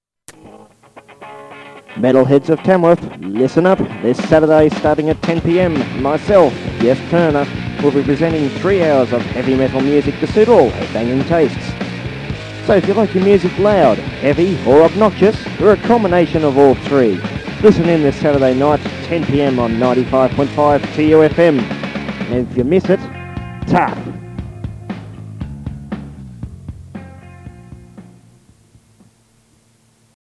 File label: Heavy Metal; Promo; 2 YOU FM